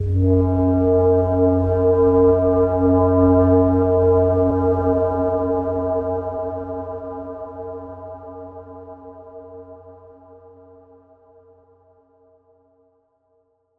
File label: Carpathia horn